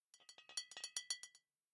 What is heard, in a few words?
Bottle Shake; The Town